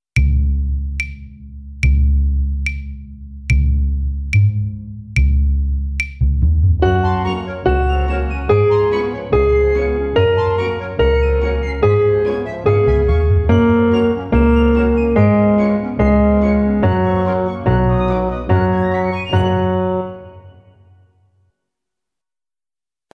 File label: Tightrope Walker Slow